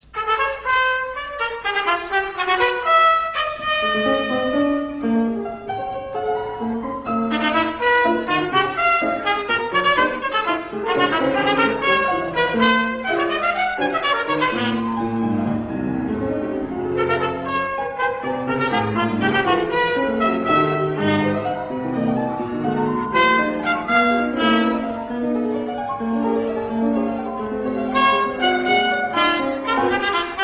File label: Trumpet